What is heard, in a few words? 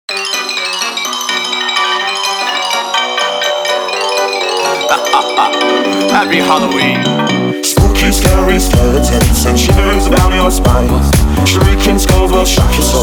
house vip remix